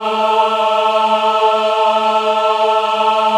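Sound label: A3 men women ah, Choir; USB Soundscan vol.28; Acoustic & Synth; AKAI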